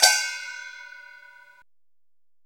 SMALL CYMBALS, ETHNIC CYMBALS; Zero-G Ambient 1

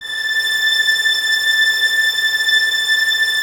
violins A 6, string ensembles; Miroslav Vitous

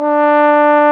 BRS TB, trombone; Roland L-CDX-03 Disk 2; BRS 550 T.Bone

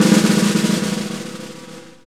SNR SNARE, Orch Snares; Roland LCDP03 Orchestral Perc; 90 s Sample CDs

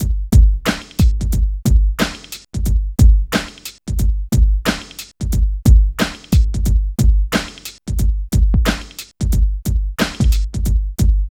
LOOP; East West - Phat & Phunky

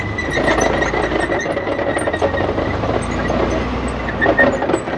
TANK; East West - Global Sounds

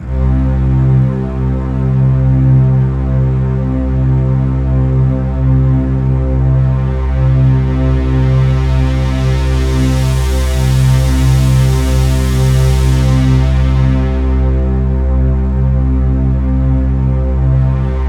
C2, Synth Line; 90 s Sample CDs; NiceBeats; SynthLine VOL-15

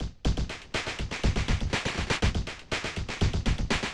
Drum Fx Loop, Electronica

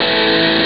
heavy guitar; ST-XX Amiga Soundtracker Sample Packs

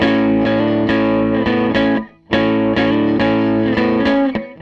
Gretsch Guitar Loop

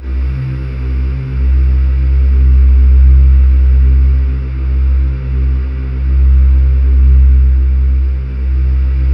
C1, Synth Leads

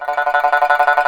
SNXN TRML D4, Heart of Asia; Spectrasonics

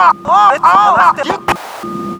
VOX FX; Big Beat Samples & More

